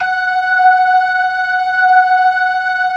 LO MAN V R, ORGAN LOWER MANUAL

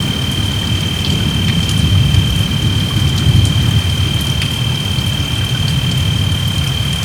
FX, Futurist Drum & Bass

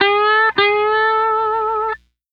A, Guitars, Drum & Bass; Total Drum&Bass; 90 s Sample CDs

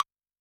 Wood Bloc, Yamaha DTXpress-II, Perc

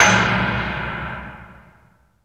CHAIN AMB; AMG - Vince Clarke Lucky Bastard

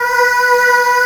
D#3 pop chorus; VOCAL

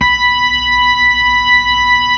B-3; Roland LCDP10 Keys of the 60s and 70s 2; Full Xfade

